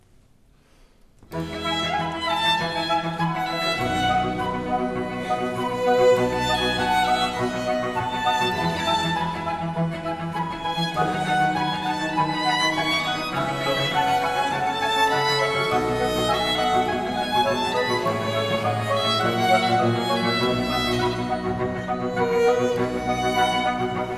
Concerto No.4 in g minor RV 297 WINTER Largo; Vivaldi; Le Quattro Stagioni

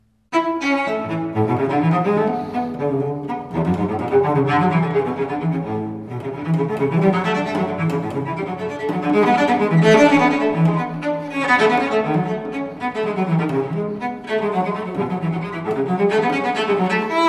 Partita Terza in La maggiore gigue, violoncello; Bach; Sonate e partite per violino solo eseguite sul violoncello

